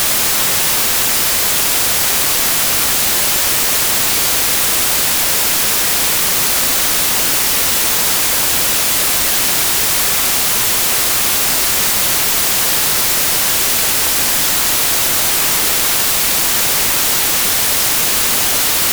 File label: White Noise